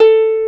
HARP; East West; Global Sounds

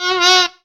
shock sax; Zero-G; Funky Elements

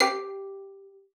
VIOLIN SPICC G4; Xsample VOL-5

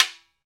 Conga Battery; EMU E-III; Samples vol.55 - Retro Sampler

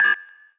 Stabs, Elektron MachineDrum; SPS1 MKII

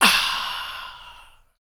KUK, MALE PERC; Spectrasonics Vocal Planet; Jazz FX

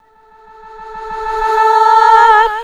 REVERS FX, REVERSE FX, Funky Vocals; USB Soundscan vol.18; AKAI

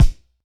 Pound Click Pad, Kicks; Airwindows

